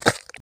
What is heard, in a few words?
mud